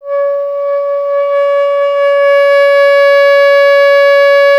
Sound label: B. Clarinet